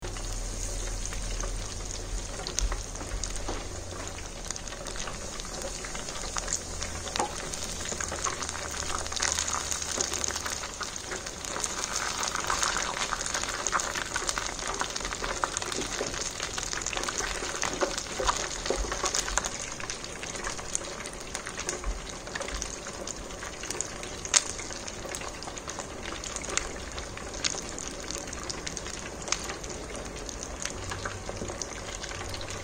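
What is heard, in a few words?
deep fryer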